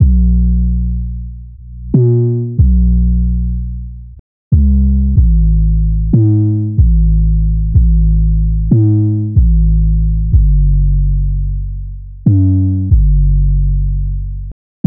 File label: Frozen 808 F